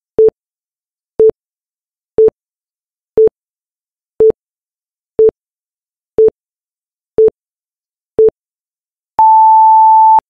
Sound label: 10 sec digital countdown